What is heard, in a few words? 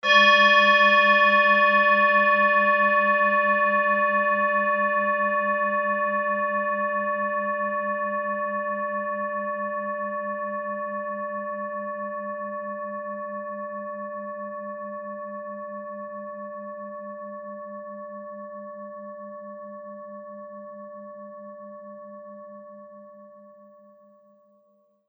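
tibetan bowl uranussaturn, G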